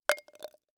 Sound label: Impact Glass Object Drop In Glass